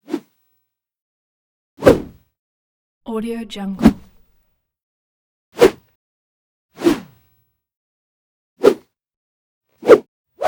designed whoosh multiple natural whooshes; transitions and movement

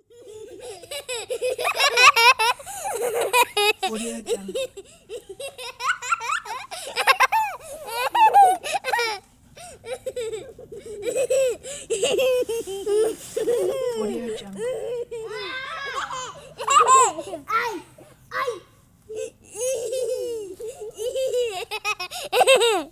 children laughing playing outside; خنده بچه ها در حال باز; human sounds

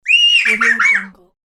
little girl squeal laugh; human sounds

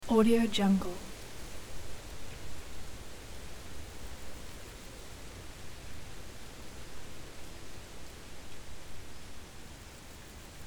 field wind insects; طبیعت کنار رودخانه; nature sounds

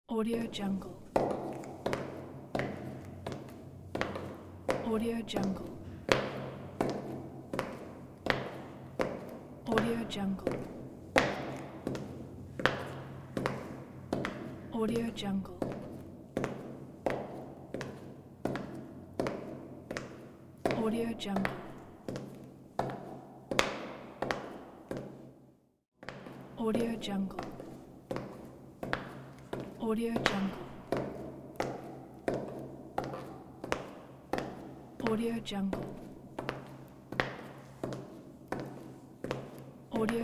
hallway walking in heels; راه رفتن با کفش پاشنه; human sounds

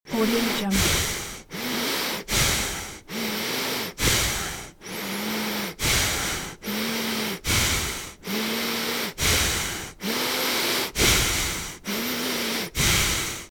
nervous nose breathing; نفس کشیدن تند و عصبی; human sounds

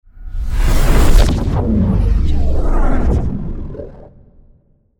space warp; futuristic sounds; سفر در زمان

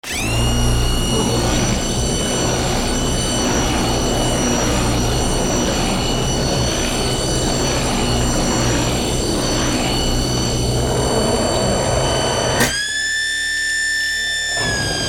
processed blender motor; domestic sounds

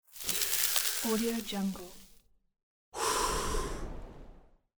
smoking

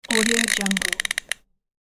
timer clock winding up; domestic sounds

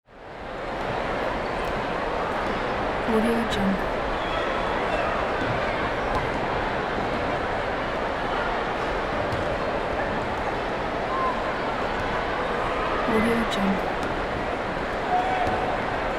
stadium crowd; human sounds

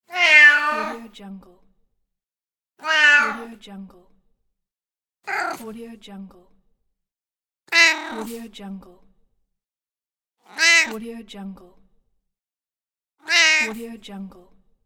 male cat; میو گربه نر

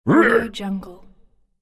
viking effort fast yell

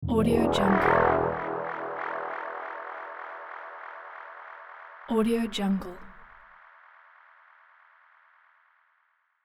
transition futuristic burb long delay; transitions and movement

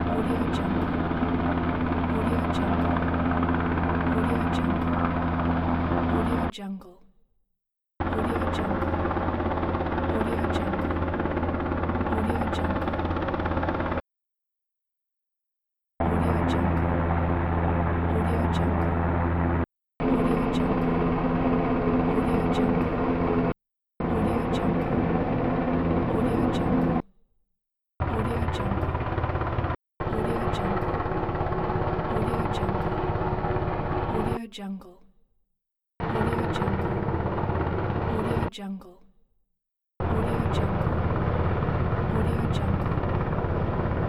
helicopter; helicopter sfx pack; مجموعه صدای هلیکوپتر

